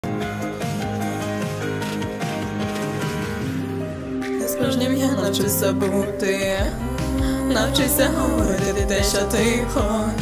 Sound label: ukr cover female ver; Hazbin Hotel